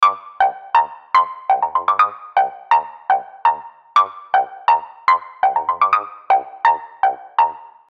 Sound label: Loop